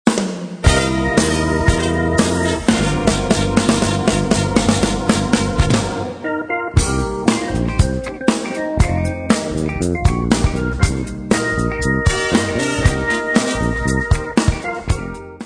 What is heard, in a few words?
funk